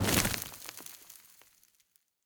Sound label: dagger crush; smite; pele